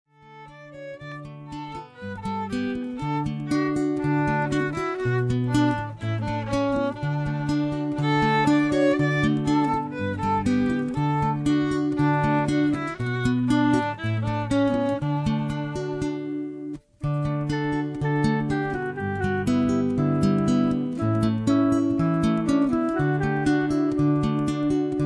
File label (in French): le truc à musique instrumental